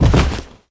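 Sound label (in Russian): ясный громкий красивый звук выстрела салюта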